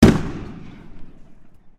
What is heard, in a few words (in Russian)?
svistyaschiy razgon gonki v lesu